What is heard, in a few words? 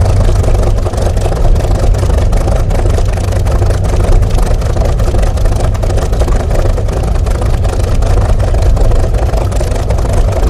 Aircrafts Engines Jumo213A; DCS